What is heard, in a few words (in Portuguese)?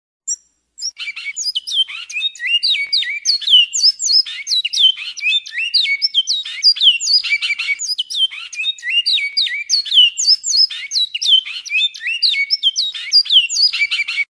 canto bicudo